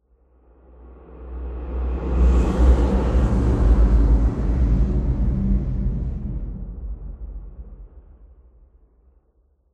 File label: amb unique lobby; Half Life Alyx